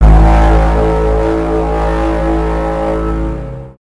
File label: tripod sound